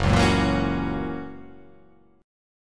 F sharp 7th, guitar

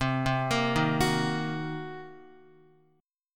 C Minor 7th Flat 5th, Guitar; arpeggiated; 4F,4A,5C,5G,5C,6E; JGuitar

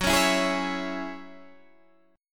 Gb 7th Suspended 2nd Gb Guitar Open D

